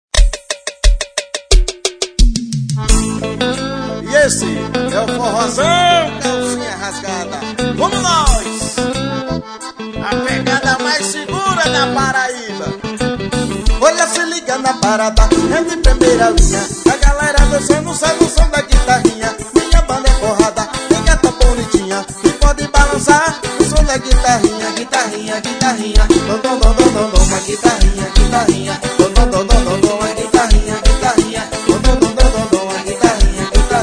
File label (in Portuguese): forrozao; guitarrinha